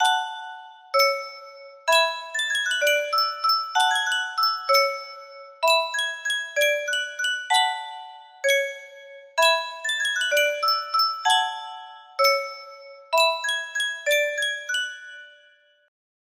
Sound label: music box, music box melody